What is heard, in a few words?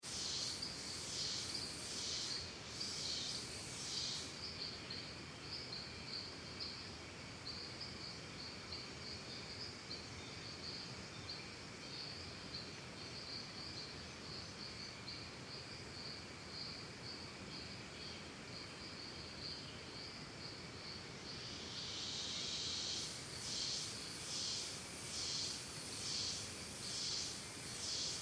Cicadas Birds Costa Rica Calm, forests and jungle; Backgrounds; Forests and Jungles; Big Room Sound